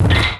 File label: attack; MEDJED